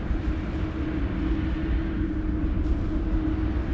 distance hive minion idle; Stellaris; guardians

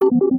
ui randomizer click; Stellaris

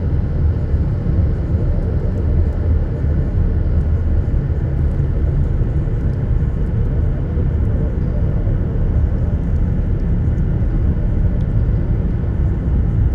colony ship hum; nemesis; Stellaris

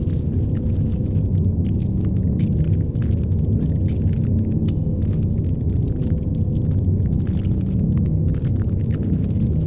pro ship titan idle; biogenesis

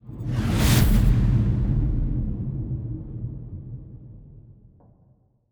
destroyer move; aquatics

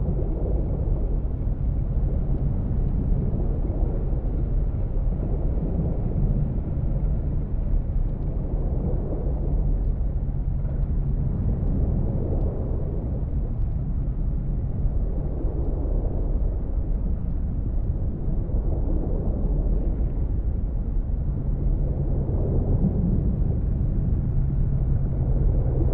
cruiser idle; aquatics

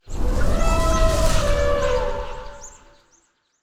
savannah planet, ambient; Stellaris

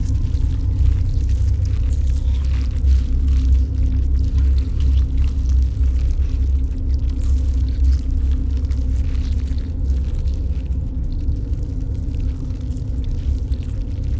ant ship mining station idle; biogenesis

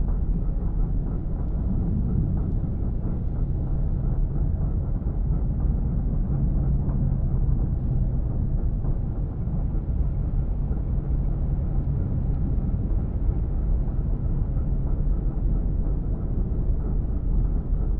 ship battleship idle; aquatics

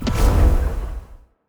healing hit, weapons; Stellaris; biogenesis

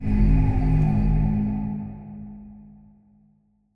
tropical planet, ambient; Stellaris

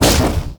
swarm scroge missile fire; weapons; Stellaris

